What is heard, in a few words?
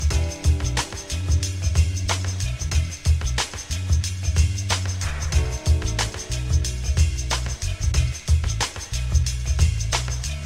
Smarthome Romantic; Deus Ex Mankind Divided